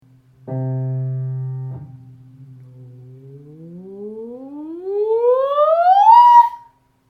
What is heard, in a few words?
Glide on KNOLL